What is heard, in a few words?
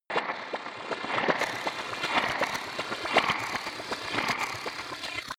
sci-fi machinery